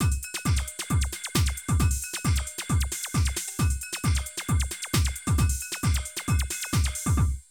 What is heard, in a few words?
Drum loops, Euro techno; Zero-G Groove Construction (1993)